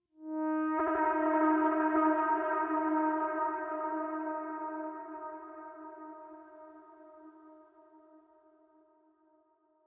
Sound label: Howl; Martian Chess